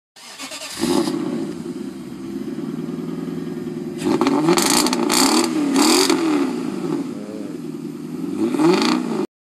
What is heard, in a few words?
Fownix FX